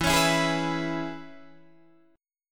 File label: E 6th, down, Guitar, Open D